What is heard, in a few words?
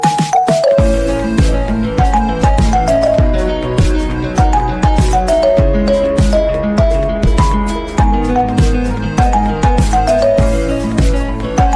free iphone 11 max ringtone